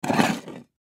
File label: Dinner Plate Push, Dinner Plate Push Aside Scrape Table; Dishware; Household; Blastwave